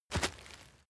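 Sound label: step leaves wet heavy, footsteps; schritte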